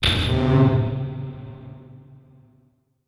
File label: Transition Hit Impact Electrical; Adobe; Production Element